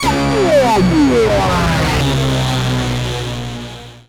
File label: Massive Swoop, Synth Sequences